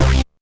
Synth Hit C; Trance; Synth Hits; Zero-G Trance Inducer; 90 s Sample CDs

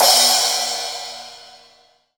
14 crash, Crash Cymbal, mono crash

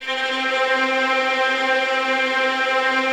Violins Tremolo C 4; Miroslav Vitous; 11 Violins

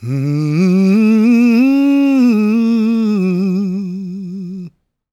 GOSP MALE, SOLO; Gospel

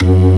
ORG VK, B-3 ORGANS Vinta Keys B3

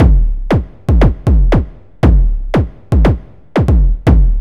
BASSDRUM; Music Store Sound Service; Einstein

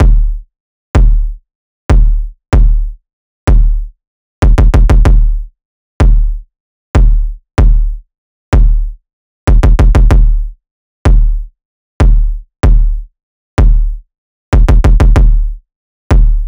loop kick 95

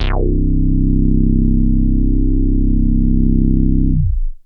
Big Synth Basses

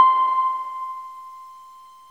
HARP C5

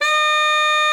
SLID D#5, ALTOSAX; Xsample VOL-2

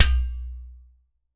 MIXED DRUMS; Best Service ProSamples vol.52 - World Instruments 2; AKAI

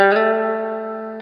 Guitar & Bass; Quantum Leap; 90's Sample CDs

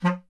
B. Clarinet

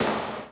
Classic Drum Loops

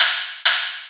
SN Fat; EMU Orbit-3

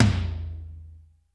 ROOM T, ROOM KITS; Crypton - DrumBase5000

